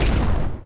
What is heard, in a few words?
Bomb Hit